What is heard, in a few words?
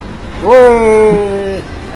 ueeeeeeee